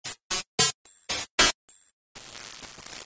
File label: Unknown Jingle; Sega Channel Demo Cartridge #4; Genesis